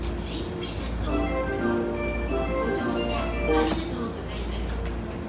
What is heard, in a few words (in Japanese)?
JR武蔵野線新三郷東, train sounds